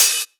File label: Open Hihats, Cymbals; Vengeance Samples